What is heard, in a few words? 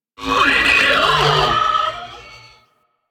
Sentient Ghost Caster Attack, Barks; Warframe; DE; Sentients; Sentient Eidolon Ghost Caster